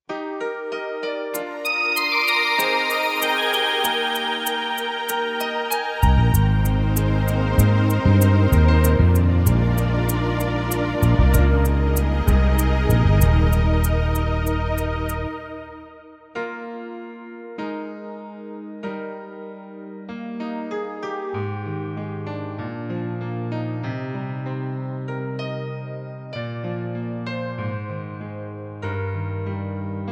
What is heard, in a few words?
Korg PA800